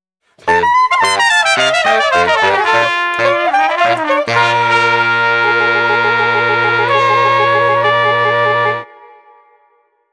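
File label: Street Brass New Orleans Transitions, 95 bpm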